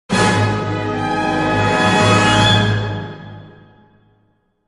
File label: battle suspense; Knights of the Old Republic II-The Sith Lords